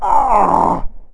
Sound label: ouch